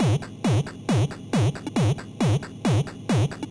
Darlek Beats

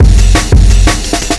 Dark Amen; Shimma

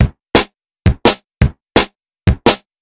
Basic Drum & Bass Loop; EzyAxis

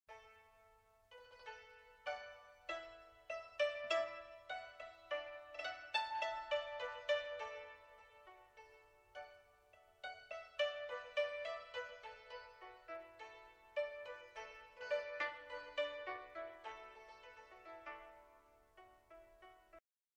Chinese Folk

